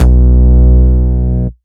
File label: Root B, Ultimate Bass; Vengeance Samples